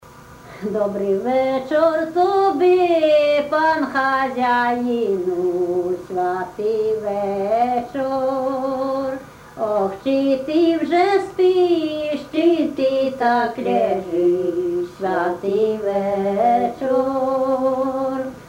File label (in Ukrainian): pisnja koljadka; Dobryj vechor tobi